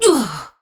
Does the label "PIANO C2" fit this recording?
No